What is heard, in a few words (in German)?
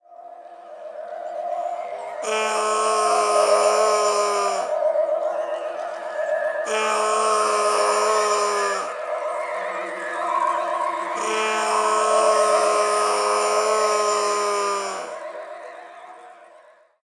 grusel klingelton